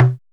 ETHTEK LO, PERC; TEK PERC1; Drums In Your FACE!

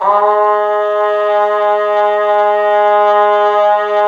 BRS TP, Roland, TRUMPETS

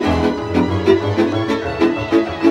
VIOLA GROOVE; Best Service; World Colours

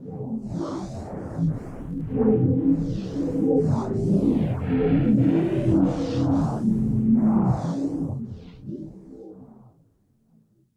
Ambient Pad, Drum & Bass Melodic Synth

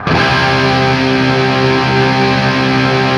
open C loop, guitar; East West; Steve Stevens Guitar Samples Collection; OP CHRD LOOP

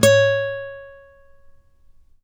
ACUSTC C#4, ACOUSTIC guitar; East West; Steve Stevens Guitar Samples Collection; ACOUSTIC PCK

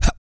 GRUNT, MALE HUMAN; ILIO; Vocal Planet

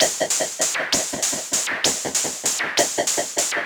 Modular 130BPM

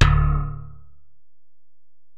Bell, Alesis DM-PRO

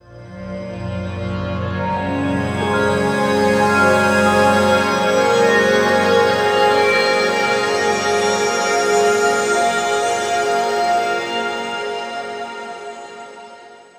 Hi Complex, Complex Wave

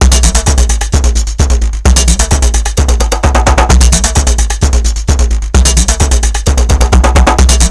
TECHNO, Funky Xtreams; INIS

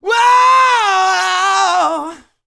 R ROCK, MALE HUMAN; ILIO; Vocal Planet

